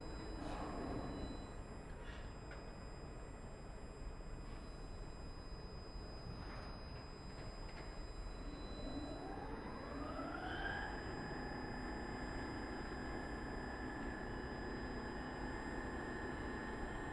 port container terminal container crane lift spreader frame movement alarm drop container; fvtt sonniss gdc audio